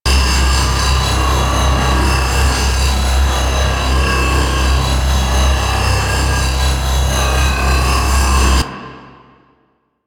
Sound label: Chain Reaction Beam Loop; Warframe; Warframes; Qorvex